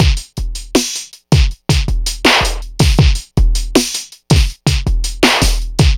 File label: vintage, Drum loops, Electro